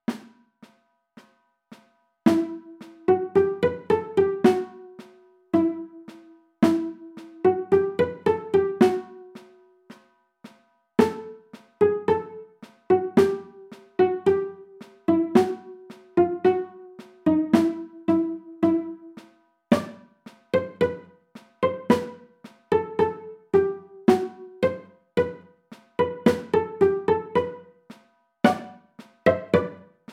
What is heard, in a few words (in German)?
midi, 1-stimmig; saporoger kosaken